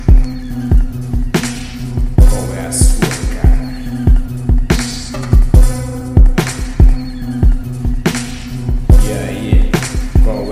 saxofonista rap

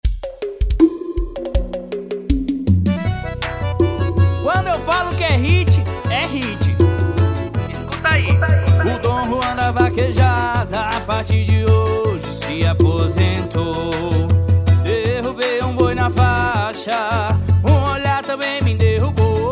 piseiro

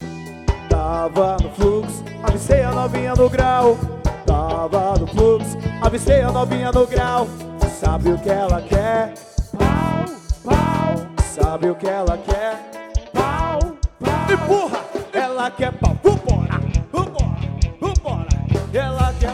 swing; swingbanda

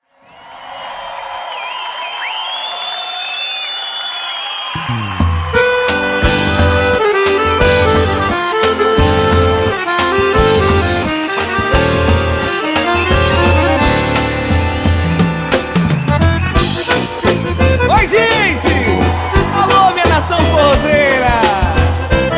xote abertura

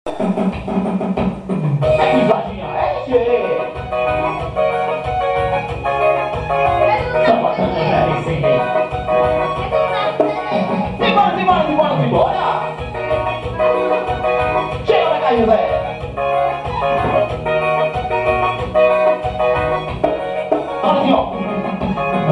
pisadinha

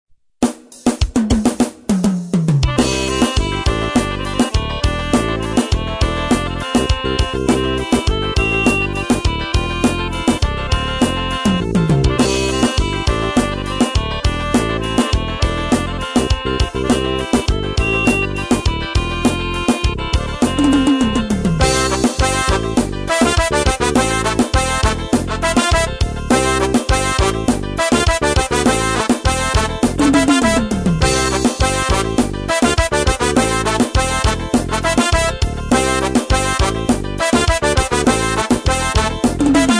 forro sequencia musical

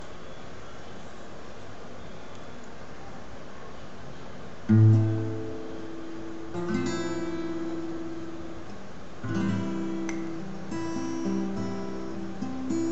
improviso testando

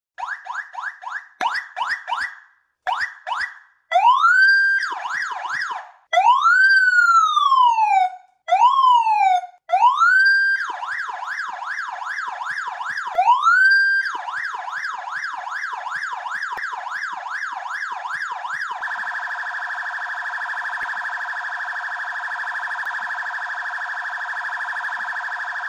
sirene; rocam

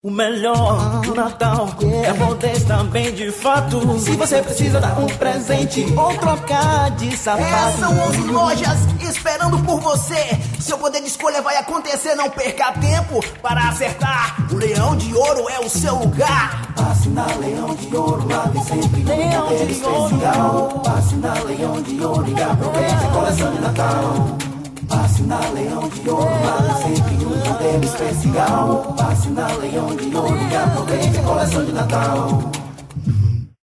jingles

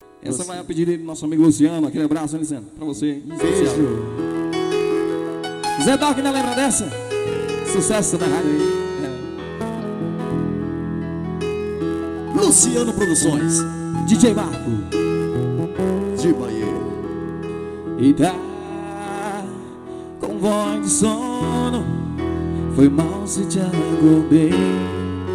ao vivo medios graves

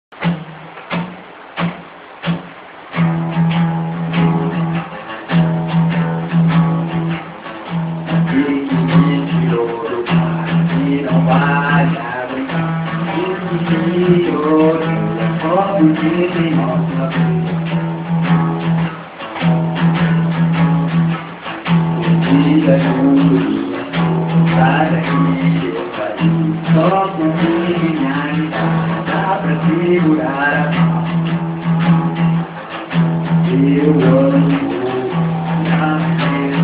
ensaio

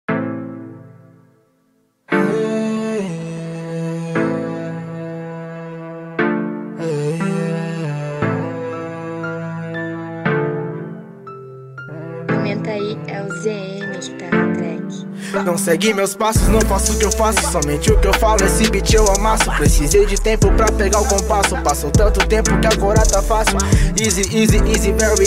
trap

